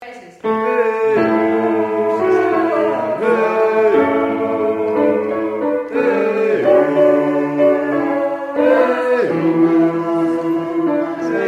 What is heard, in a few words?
bass